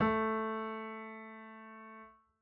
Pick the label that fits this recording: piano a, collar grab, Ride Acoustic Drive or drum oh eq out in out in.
piano a